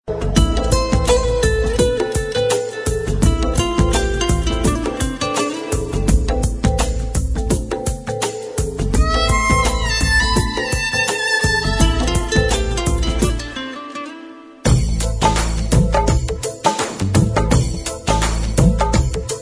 instrumental melody

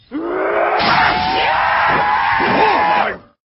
zombie screams; call of duty black ops

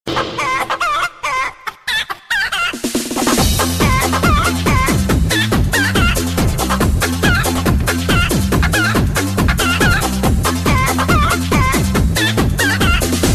chicken disco

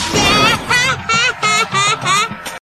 dahahahaha

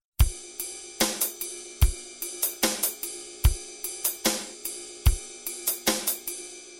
drum beat

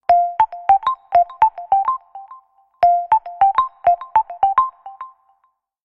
nokia lumia ring

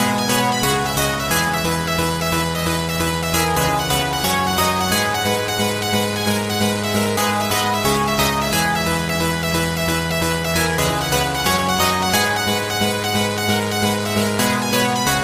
tone mix

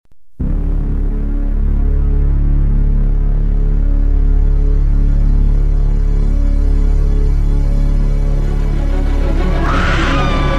best horror tone

